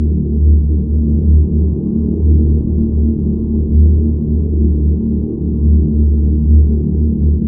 Amb Horrorhall Loop

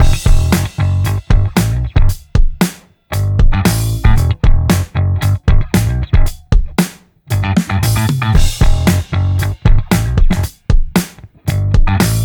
Music Funk; Turbo Dismount

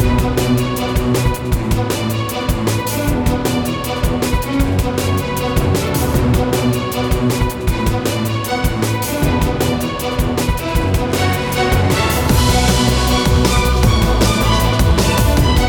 music impair; Castle Storm

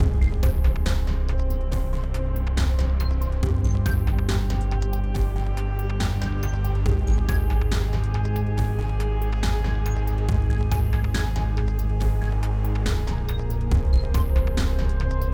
Rezoning Exploration 8CH 70BPM

